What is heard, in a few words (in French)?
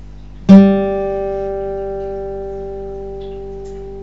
sol, guitare